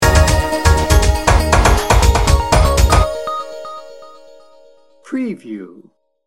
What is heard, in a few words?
pulsing moonbeams sting bumper